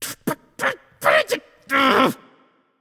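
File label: grumble, non-verbal; voicepack; smite; merlin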